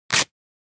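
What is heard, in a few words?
Cloth Split Rip Tear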